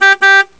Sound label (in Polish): klakson